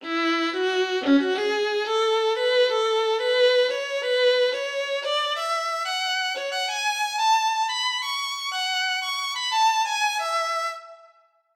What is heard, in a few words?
note short